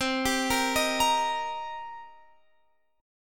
C Minor 7th C Guitar Standard; x,15,17,15,16,18; Cm7 arpeggiated; capo=3

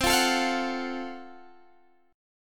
Gb Minor Major 7th Flat 5th, chord

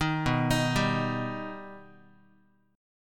B Augmented B Guitar, chord; arpeggiated; JGuitar

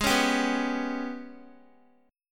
G sharp Minor Major 7th Double Flat 5th, Guitar; strummed; JGuitar

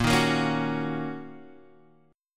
D sharp Minor 7th

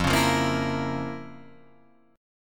F Major 7th Suspended 4th Sharp 5th Guitar Drop D; down; chord shape

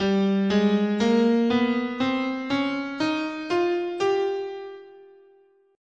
G Eight tone Spanish, scale

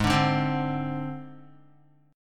G sharp Major Flat 5th, Guitar; G#Mb5 strummed; JGuitar

